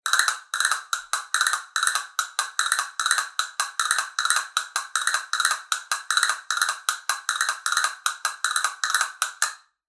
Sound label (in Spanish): castañuelas fibra concierto